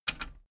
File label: metal button radio click enable fast